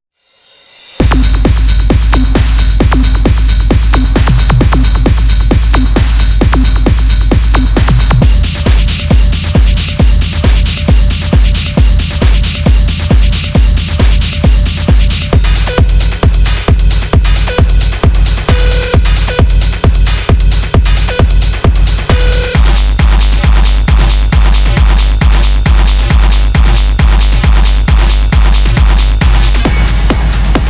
Hard Techno

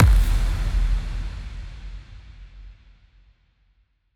Impact

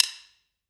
PERC

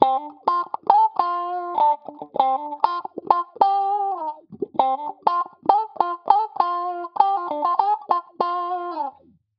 Guitar Loops Funk 117 Dm FX

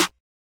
Clap Snare

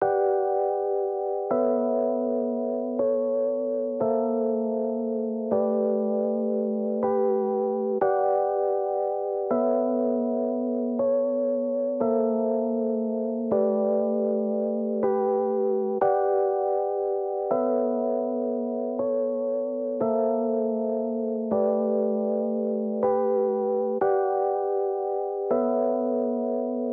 Rhodes

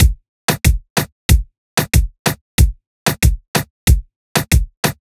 TAIKO 93 drum loop; actitud

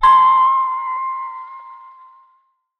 Boom Bap Bell, C

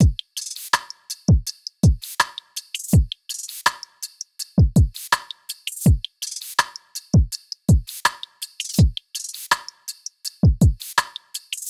164 drum loop bouncy; spicy texting

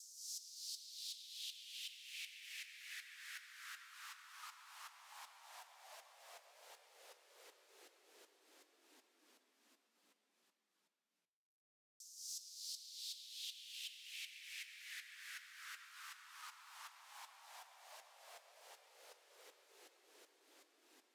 downfilters

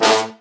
horn stab horn A

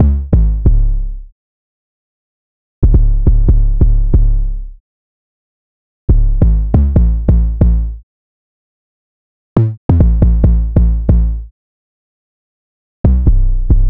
C m 138bpm 808; Drippy Drill Melodies

